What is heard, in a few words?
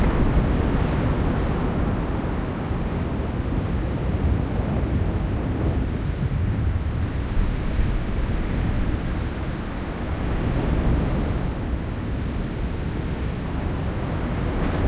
ambient; World of Tanks